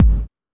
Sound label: Techno E; EMU XL-7